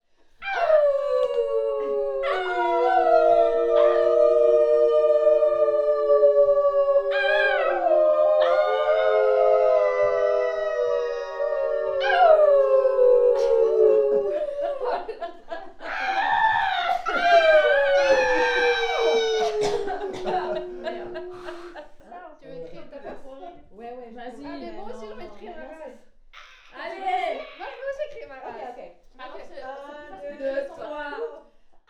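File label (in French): mix sound hunters; risoux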